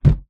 foley balloon hit impact thud; zapsplat

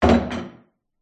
pub wooden bar stool set down wood surface; field reports

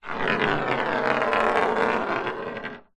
foley ballbearing roll across wood surface; zapsplat

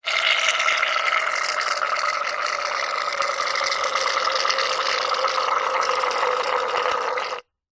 household shaving foam spray into tube

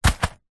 household mobile phone drop grass; zapsplat

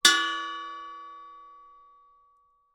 industrial gas cannister medium hit ping

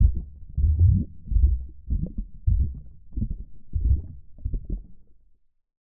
human bodily functions stomach rumble close up

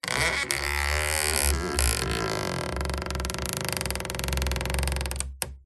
Stress Creak Squeak Groan; PMSFX

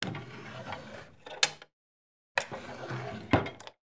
Wooden Drawers, Open, Close; lilesound

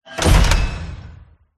OBJECT MEDIEVAL Door Metal Close; smartsound

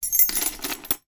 household door keys hang on hook; sound effects one

